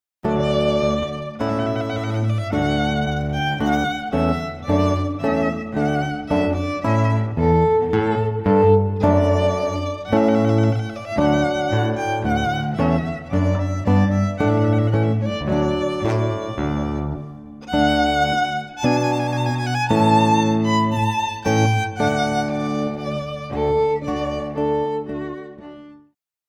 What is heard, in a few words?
Trio of Guitar; guitar violin cello; Trumpet Voluntary